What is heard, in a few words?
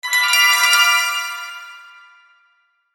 Logo Bells Bright Reverb; Sonic Logo; Blastwave; Sonic Logos; Production Element Imaging Element Accent Transition